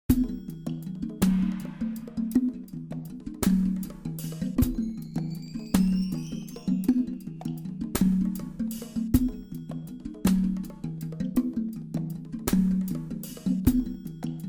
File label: loop african walk; WMBonusXP